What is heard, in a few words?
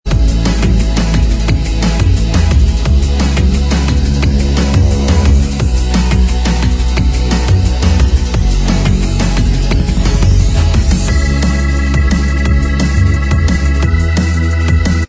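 instrumental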